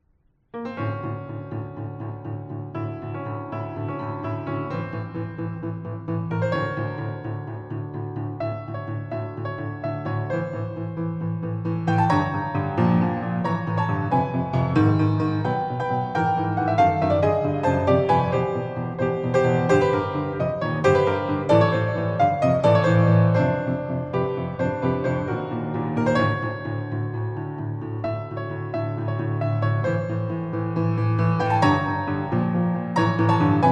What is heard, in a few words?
Piano Solo